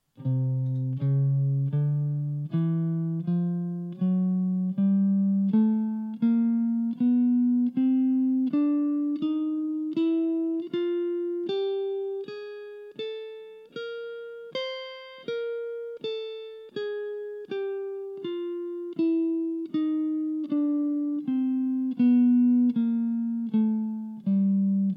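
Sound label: C Mixolydian Chromatic, guitar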